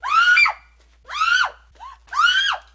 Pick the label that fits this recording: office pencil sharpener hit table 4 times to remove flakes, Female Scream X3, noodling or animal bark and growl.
Female Scream X3